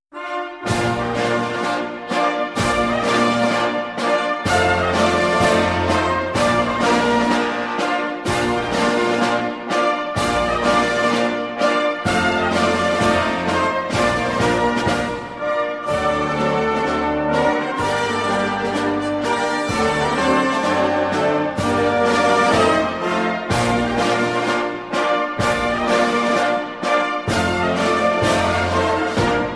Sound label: italian anthem